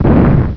Spikes shoot; Bedlam 2 1.1.1; Bedlam Sounds

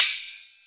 Springy Splash, drums; music; rashdemo

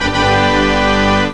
Fanfare Finish level; Frog Xing 1.1

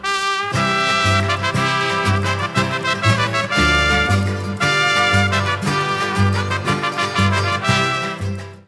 mariachi

